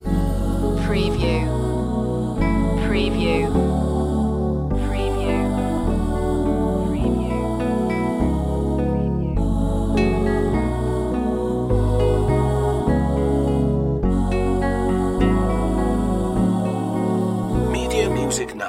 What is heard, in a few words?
bows and buttons loop